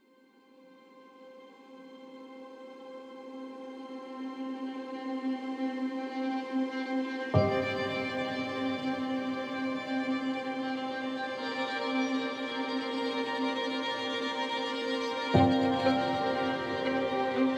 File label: violin; An exciting mixture of different playing techniques of the violin; GENTLE AND RAW; CINEMATIQUE